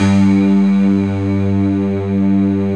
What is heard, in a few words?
D50 Stak, Roland; 90s Sample CDs; Club-50 - Foundations Roland; D50 Syns